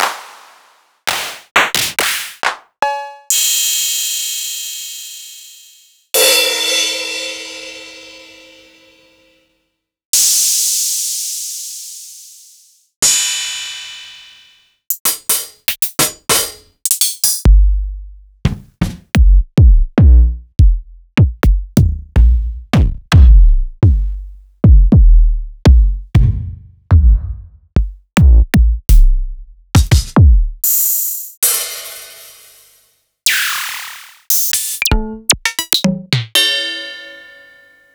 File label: Drum Samples